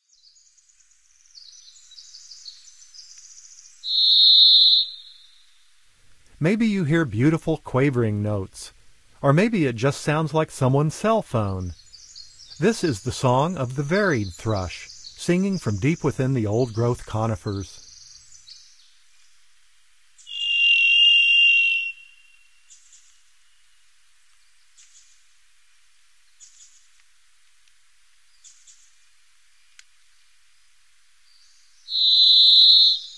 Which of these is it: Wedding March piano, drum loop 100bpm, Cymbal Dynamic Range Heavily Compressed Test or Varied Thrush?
Varied Thrush